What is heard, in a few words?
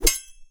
sword clash